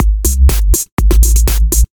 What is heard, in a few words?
beep Loop 122bpm